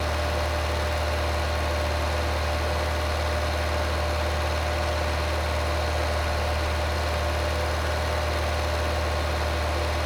Compact Loop

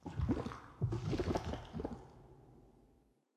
Ambience Bubbles; Level17

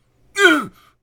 Grunt

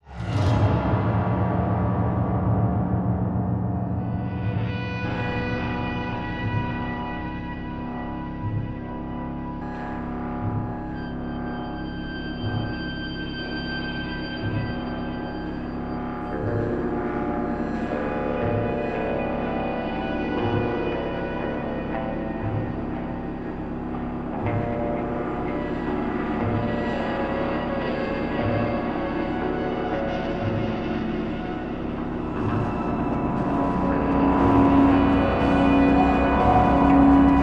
AMBIENT NOLOOP; transformers war for cybertron gamerip

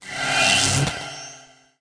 Energyblade; New The Denpa Men

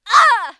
Player Scream Pain; Power Drill Massacre

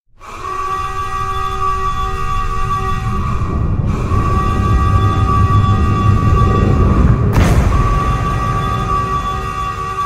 Power on; criminality

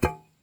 metal pot soft hit

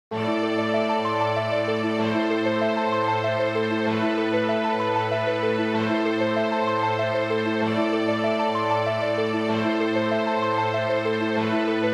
music loop; Dangeon Quest; android game music

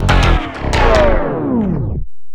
DJ Disco Stop

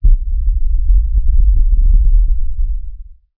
Music Bass

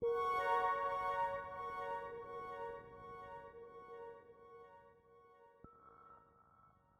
85bpm

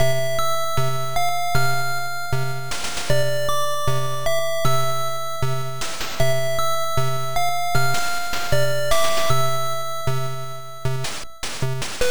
A Victorious Bell is Ringing; sharp x1 turbo; psg; Herzog

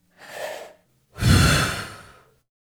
Blow out Candle

